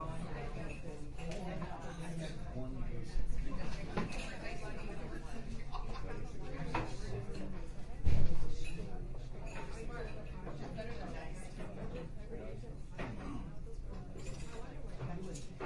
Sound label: people